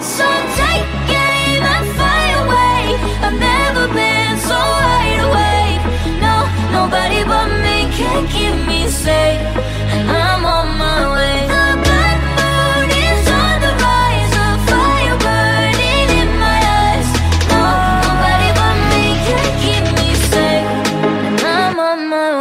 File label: English Ringtone